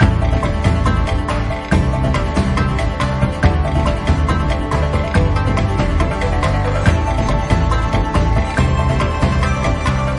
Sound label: bgm; WaterMarginFishing